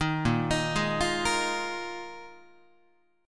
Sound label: A sharp 7th Suspended 4th, Guitar; arpeggiated; 6,6,6,6,9,9; chord; JGuitar